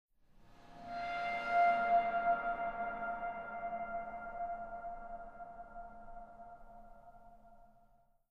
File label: stinger; Myst III Exile